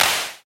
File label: clap, drum; drum stick